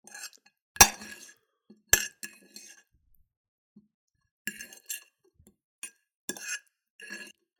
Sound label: food stir fry scrape onto fork plate; zapsplat